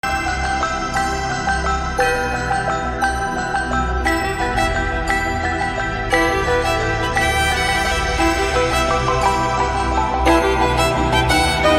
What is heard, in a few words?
violin cover; carol of the bells; christmas music